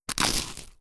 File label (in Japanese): kaziru